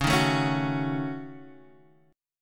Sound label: Gb Minor 6th Db